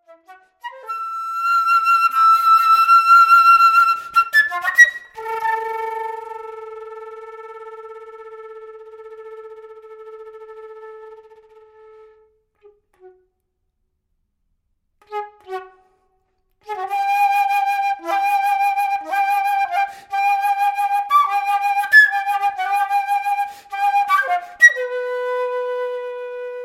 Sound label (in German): flatterzunge